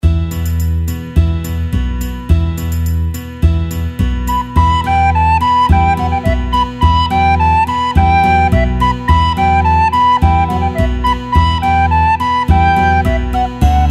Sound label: instrumental; im schlossgarten